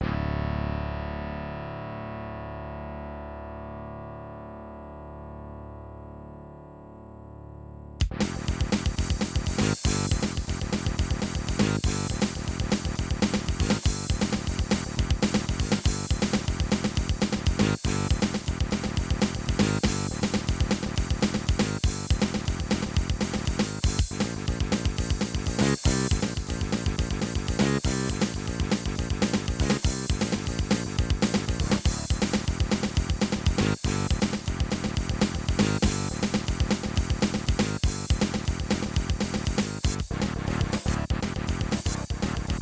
acer magic s23a; soundcard test; Doom

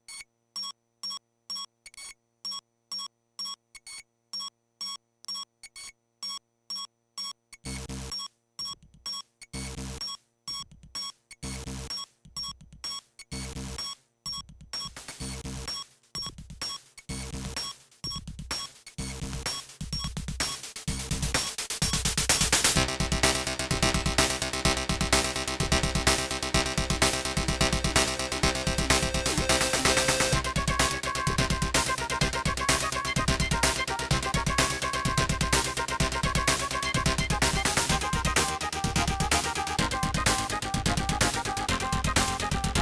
sb 16 ct2740; Creative SoundBlaster 16 ct2740; Soundcard test

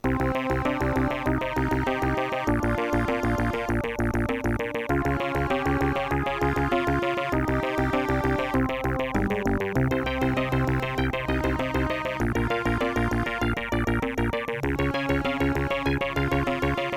intro, Creative SoundBlaster 16 ct2740; soundcard test; Hocus Pocus

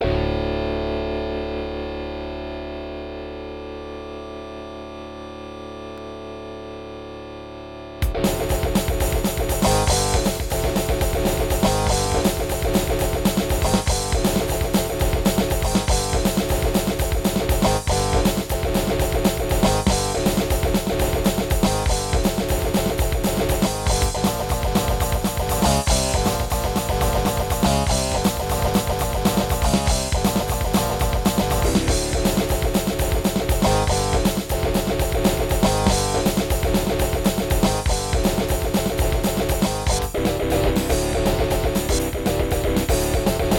aztech sg waverider32; AZTech SoundGalaxy Waverider 32 Plus; soundcard test; Doom

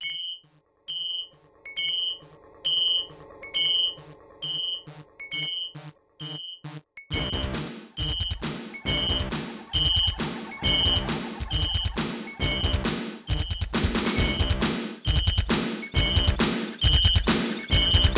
terratec ws scw0012; Terratec WaveSystem SCW001/2; Soundcard test

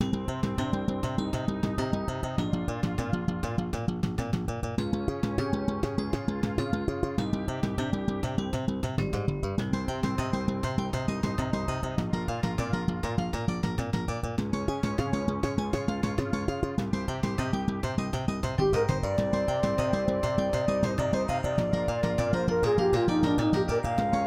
intro, akai sg01k; Soundcard test page; Hocus Pocus

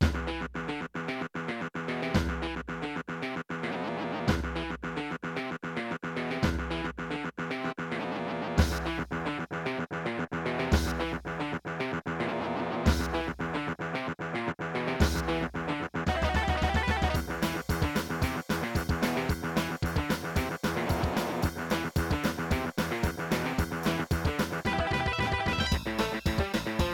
aztech sg wr pro32 i; AZTech SoundGalaxy Waverider pro 32-3d(i); soundcard test; Doom

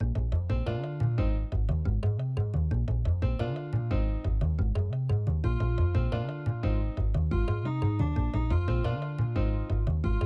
level1, Korg Ag10; soundcard test; Hocus Pocus

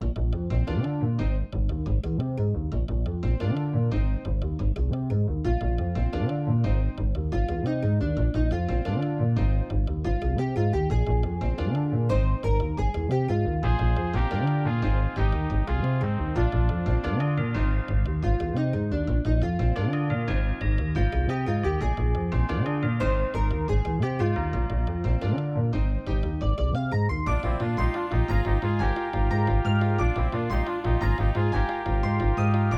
level1 roland scb7; soundcard test; Hocus Pocus

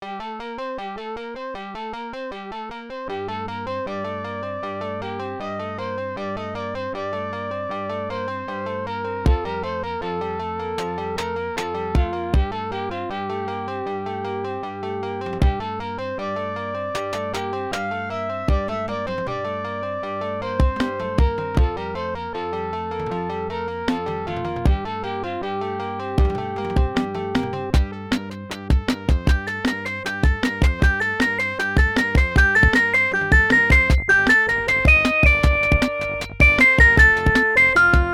intro, IBM Music Fature Card; soundcard test; Silpheed

